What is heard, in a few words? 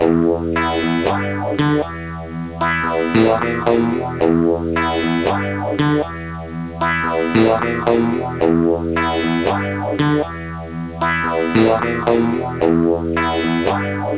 phase 90